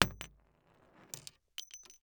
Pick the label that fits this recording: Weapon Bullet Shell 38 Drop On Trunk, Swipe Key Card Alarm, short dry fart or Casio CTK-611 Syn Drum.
Weapon Bullet Shell 38 Drop On Trunk